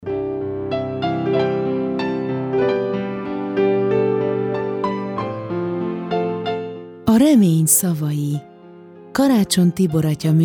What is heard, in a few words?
JINGLE; A remeny szavai